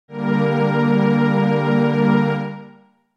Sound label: Bb G Minor